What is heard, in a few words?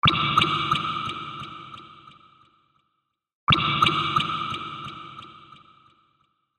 echo